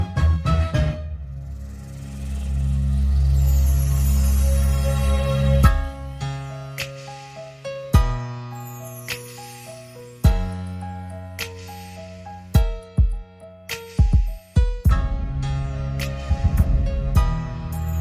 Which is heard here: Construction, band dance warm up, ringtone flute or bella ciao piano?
band dance warm up